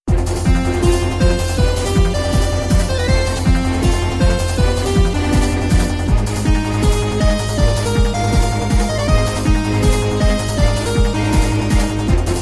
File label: Beta Speed Powerup; Freedom Planet Official Soundtrack